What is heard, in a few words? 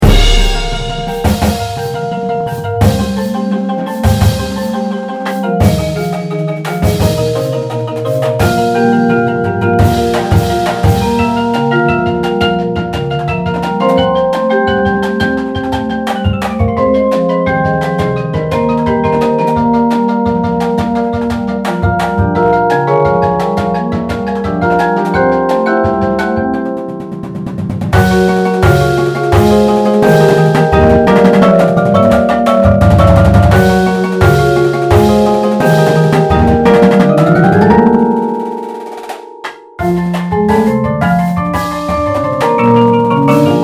PERC, percussion; HALLOWEEN